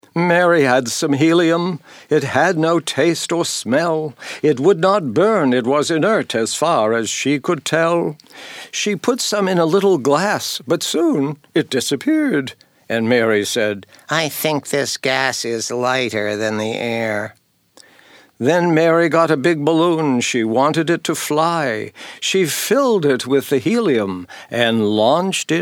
Helium Element Jingles

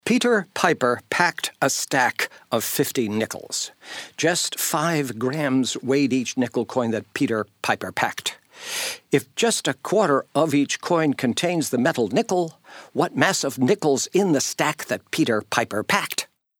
Nickel Element Jingles

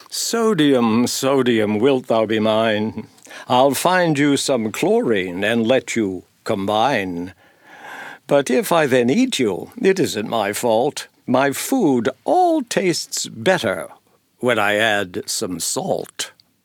Sodium Element Jingles

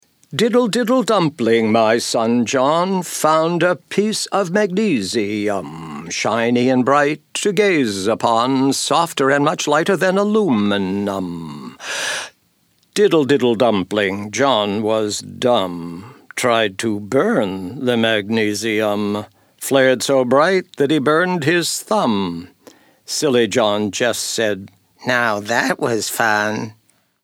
Magnesium Element Jingles

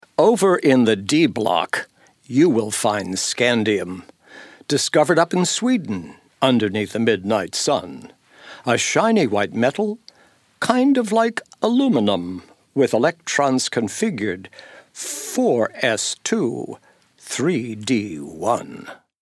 Scandium Element Jingles

